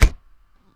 B IMPACT; Notrium

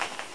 Short Rustling; Curse of Dragor

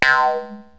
twang, cartoon; Wired for Sound Pro CD; Aristosoft, Inc.

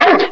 dog bark

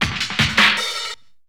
drum loop D+B 160; Drum+Bass

